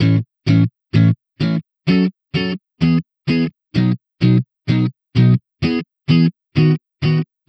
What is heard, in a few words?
Electric Pop Guitar 128BPM Amaj; Vengeance - Essential Clubsounds Vol.4